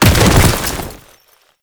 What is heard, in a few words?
Bodyfall; Warframe; Lasrian Soldiers; Foley; Body Falls